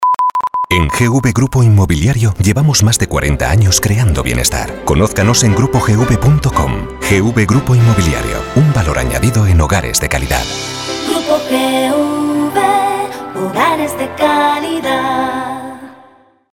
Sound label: JINGLE